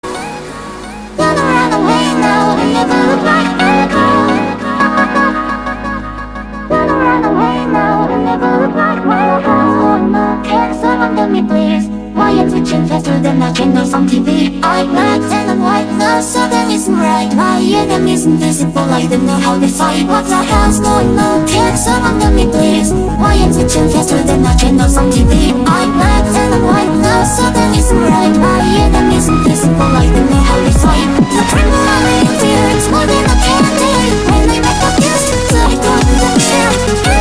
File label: sms echo